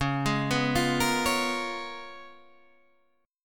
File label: C sharp 13th C sharp, Guitar, Standard; arpeggiated; C#13; JGuitar